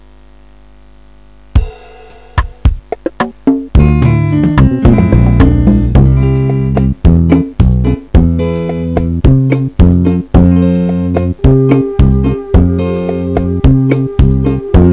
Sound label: rhumba